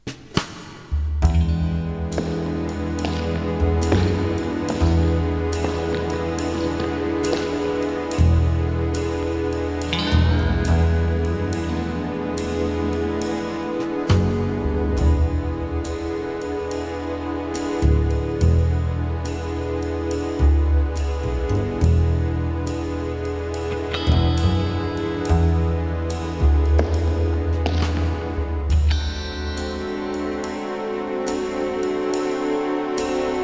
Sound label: jazz